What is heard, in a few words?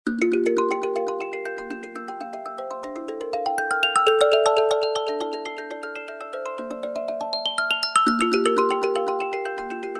ringtones; Tecno Spark 40C; Meteor Shower